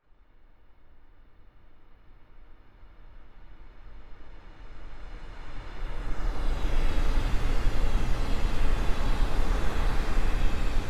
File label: Passenger Train synthesis